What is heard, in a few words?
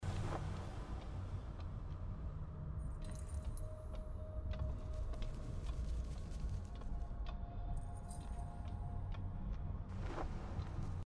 soundscape sky; gris